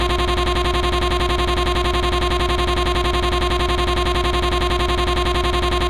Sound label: Second note of Tank solo